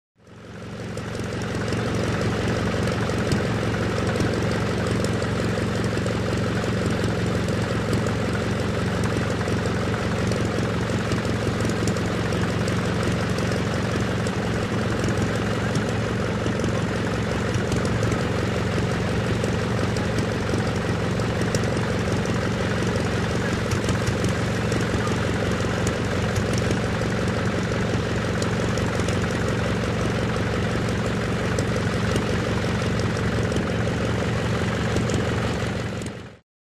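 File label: 1940s Single Prop Plane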